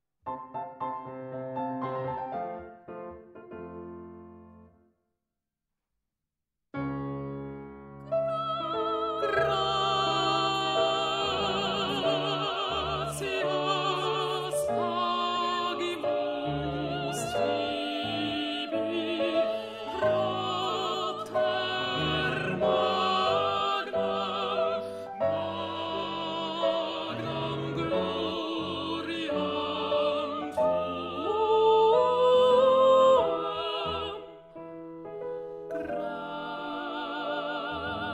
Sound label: gratias alto